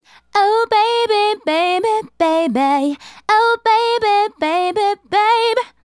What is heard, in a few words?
Female Solo, Hip-Hop and RnB Vocals